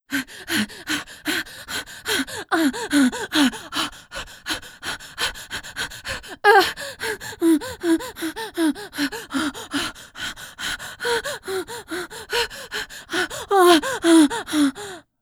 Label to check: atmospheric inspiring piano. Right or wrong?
Wrong